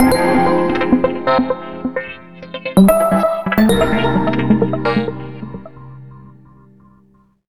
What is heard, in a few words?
Aero, ringtones